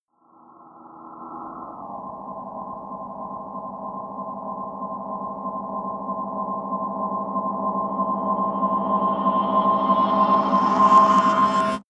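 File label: rise haunted, drones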